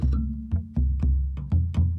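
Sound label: Calm Far, music; From Dust; PS3; Xbox 360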